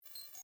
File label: dropdown open